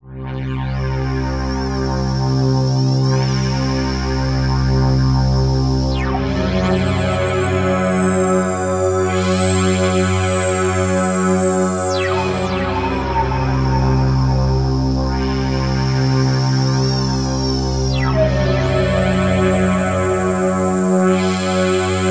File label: drones on a dull silver platter dry; aas; objeq delay